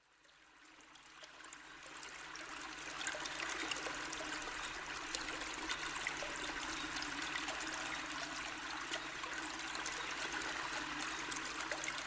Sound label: Trilling Rill, Biolateral